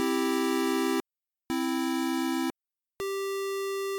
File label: Multi square